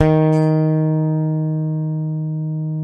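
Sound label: Sadowsky 5 bass, D#3; Sampleheads; Will Lee Bass Library; SADWSKY5 SUS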